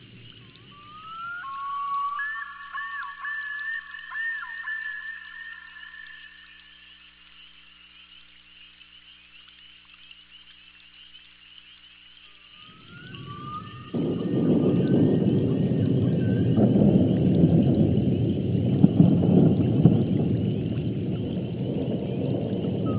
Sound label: thund, bruecken